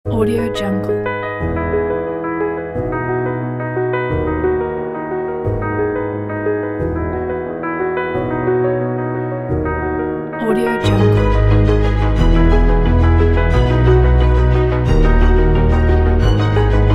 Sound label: emotional beat; stereo-color